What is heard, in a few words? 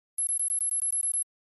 Digital Timer Type 2, timers